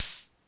polyvox hat, Drum Machines, Formanta Radio Plant Polivoks